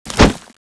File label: zombie swing